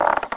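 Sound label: crossbow bolt back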